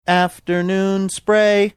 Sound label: singing afternoon spray